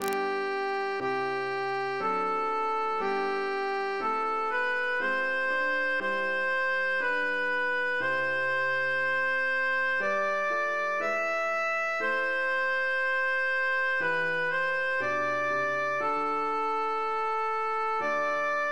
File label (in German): chor sopran